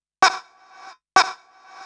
House Loops, Vocal Loops House 128BPM

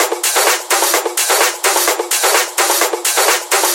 House Loop, 128 BPM; Vengeance Samples

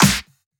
Pre-Shifted Claps; Vengeance Samples

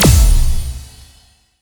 Hits & Explode

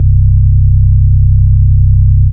Ultimate Bass Root C; Vengeance Samples

